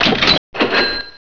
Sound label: Score Count End; Loony Labyrinth 1.02 Demo; Arcade